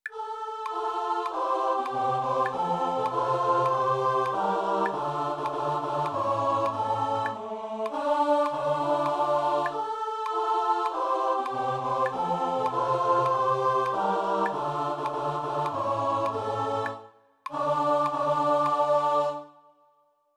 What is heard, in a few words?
English refrain